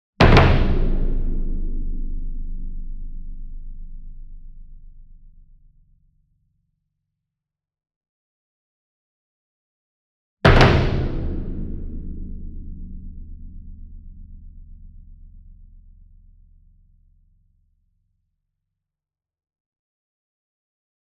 SINGLE DRUM Bass Drum Concert 40 Inch Double Soft Beater Medium; boom library; Cinematic Strikes Construction Kit